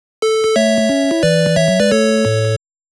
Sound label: Victory; Nekojara Monogatari